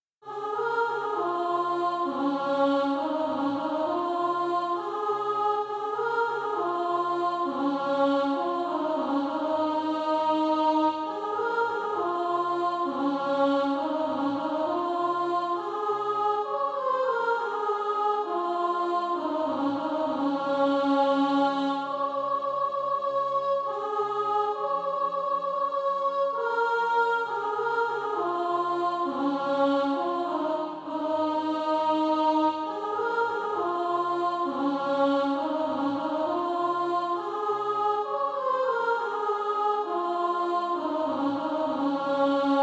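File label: soprano, SATB Practise Music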